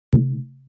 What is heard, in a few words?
balloon; Golden Crayon Sounds